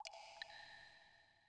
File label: water drip; Rust